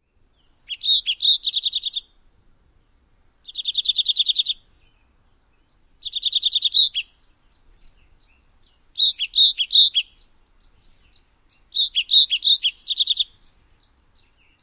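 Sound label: Olive Warbler, songs number 1; AZ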